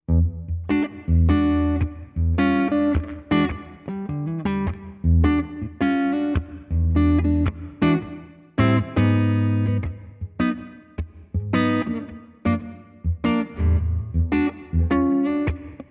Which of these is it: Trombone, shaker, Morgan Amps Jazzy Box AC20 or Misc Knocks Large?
Morgan Amps Jazzy Box AC20